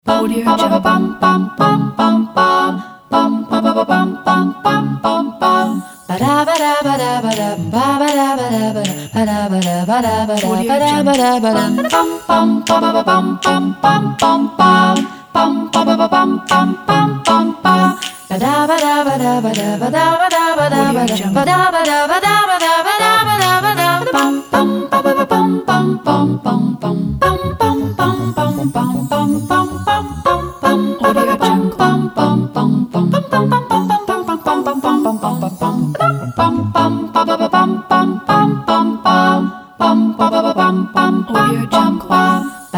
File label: nutcracker march acapella; tchaikovsky